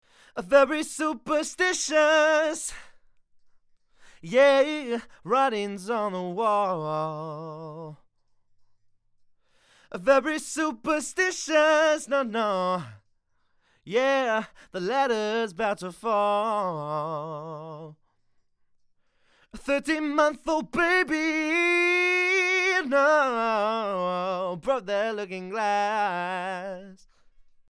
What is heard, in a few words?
Vox